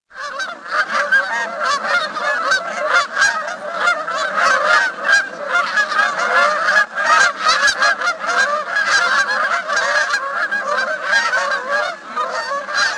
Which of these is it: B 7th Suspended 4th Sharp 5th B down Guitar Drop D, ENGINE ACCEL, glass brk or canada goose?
canada goose